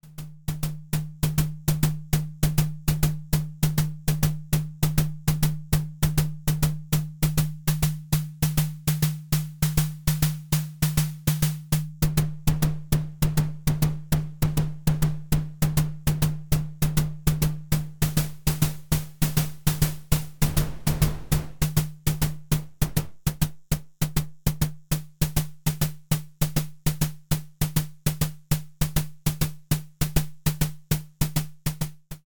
Pittsburgh Modular Lifeforms Percussion Sequencer Multi Layer